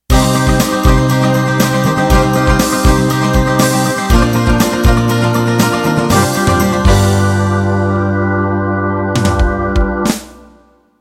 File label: Country 16Beat End